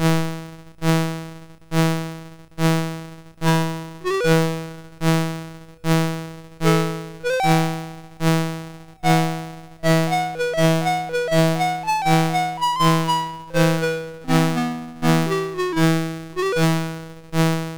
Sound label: sid MOS6581R2